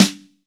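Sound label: JAZZ snare, GM drum set; AKAI S-Series CD-ROM Sound Library VOL-8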